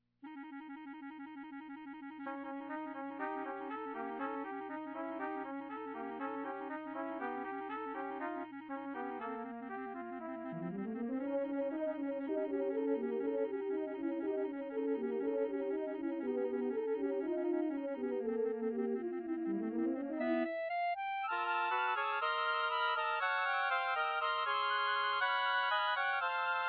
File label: Latino Preludes